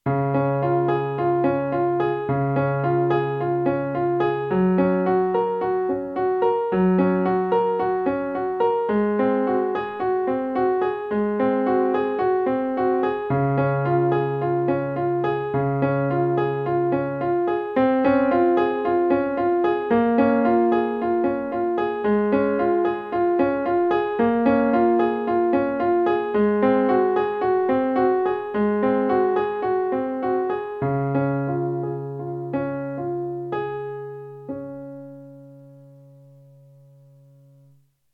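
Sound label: prelude in d flat major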